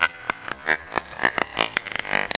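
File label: warrior idle, bugs; Starship Troopers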